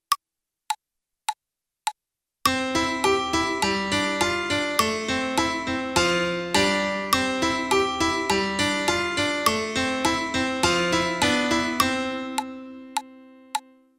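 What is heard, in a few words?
Baroque Melody, piano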